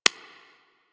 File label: UI Focus Fill; DE; Warframe